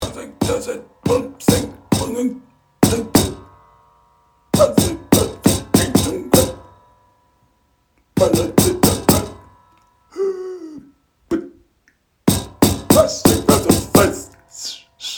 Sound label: Groaning